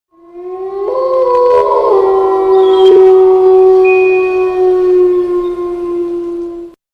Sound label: HORROR